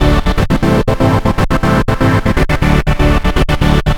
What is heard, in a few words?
Trance Synth